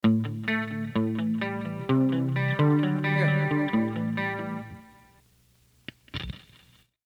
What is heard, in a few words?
click